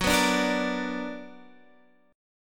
B Minor Major 7th

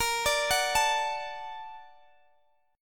A sharp Augmented Major 7th, Guitar Standard; arpeggiated; capo 3; x,x,20,19,19,17

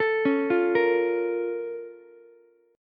Bb Minor Major 7th Bb down Ukulele, BbmM7 chord; arpeggiated; JGuitar

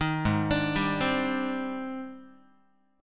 G sharp Major Sharp 11th, Guitar; G#M#11; 6,4,5,5,4,x; arpeggiated

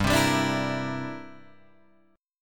C 9th G down Guitar Standard; C9/G strummed

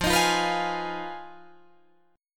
F Minor 7th Flat 5th F down Guitar Drop D

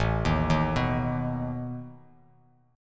A Suspended 2nd Flat 5th A down Bass Standard; Asus2b5 arpeggiated

